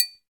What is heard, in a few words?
triangle, percussion